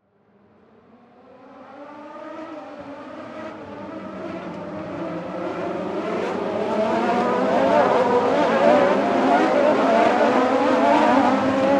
Grand Prix Race, Sports, Grand, Prix, Race, Cars, Pass, By; Race Car Sound Effects; BlastWave